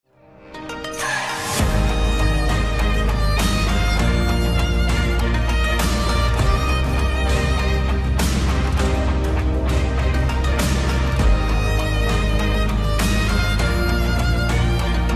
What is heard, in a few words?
Samsung Galaxy Z Fold 3, tono de llamada